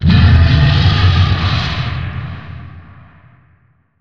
revenge roar layer; weapons; dota; creep

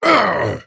attack effort small; dota; axe

